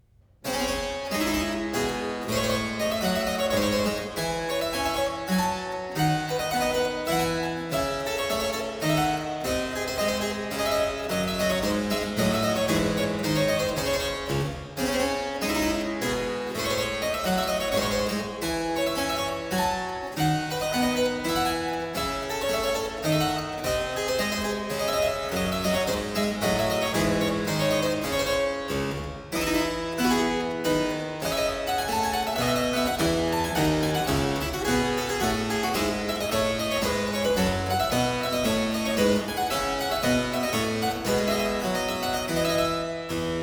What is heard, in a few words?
Round O, harpsichord; Complete Chamber Music; Brilliant Classics; Miscellaneous pieces for the harpsichord